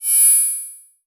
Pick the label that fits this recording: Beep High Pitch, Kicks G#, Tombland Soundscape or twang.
Beep High Pitch